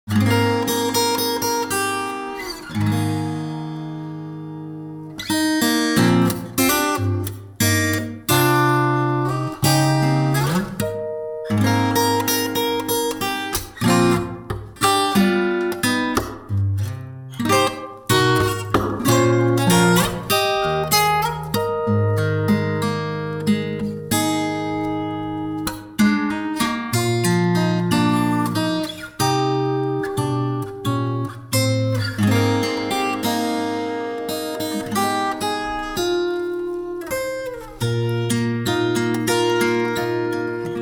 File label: FaV (Acoustic)